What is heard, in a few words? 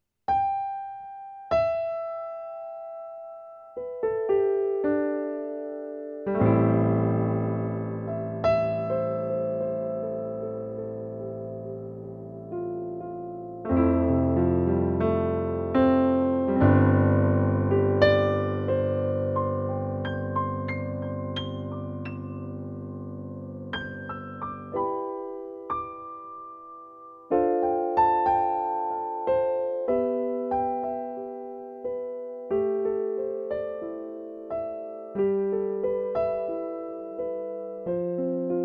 Roland HP702 Concert Piano